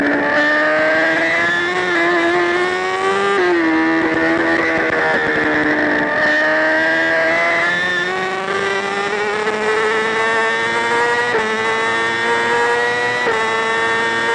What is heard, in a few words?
kawasaki zxrr motogp